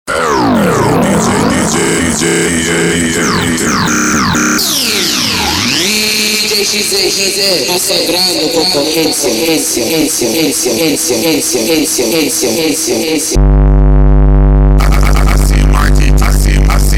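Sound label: slowed, phonk music; funk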